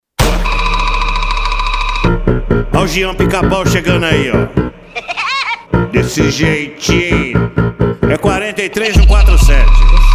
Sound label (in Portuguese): Jingle Parodia Funk